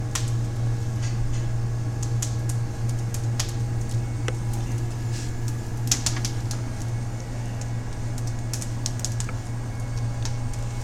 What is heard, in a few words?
Fire